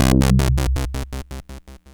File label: bass echo; free techno acid samples